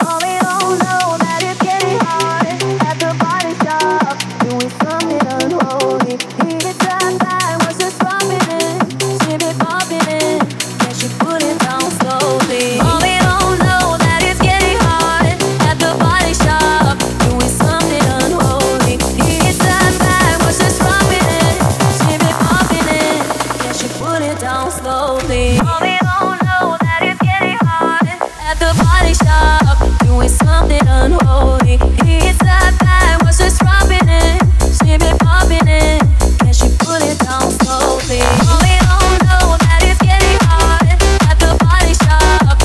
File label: unholy techno remix; club